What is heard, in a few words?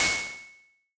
synthesizer metal